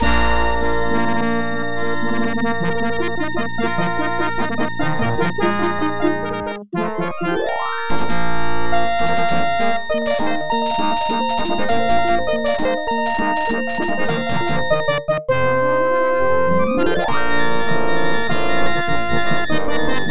Opening Crawl; star wars x wing; ibm pc at

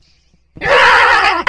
kamikadze walk

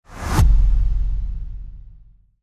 whoosh; Piano app by Yokee

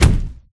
chase footsteps; Poppy Playtime; mommy

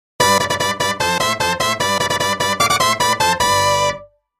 Area Clear

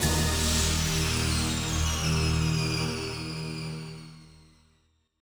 Mission 1 Completed; time crisis ii; namco system 23 arcade

